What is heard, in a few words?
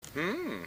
hmm; Gachi